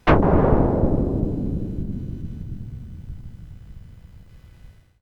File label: Explosion